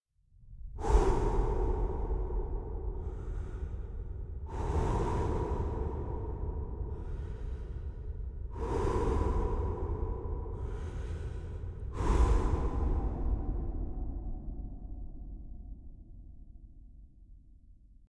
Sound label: Breathing Echo space, Ambient Sounds; Debarup Travel & Films